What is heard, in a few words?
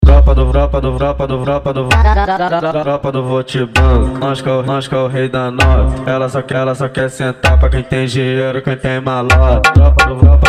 funk mega bass